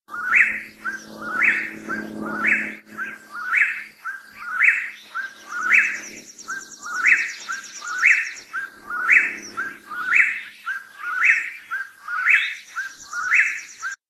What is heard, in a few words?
Whip-poor-will